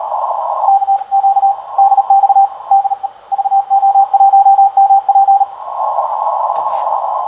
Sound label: 7MHz